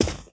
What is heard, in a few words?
carpet, footsteps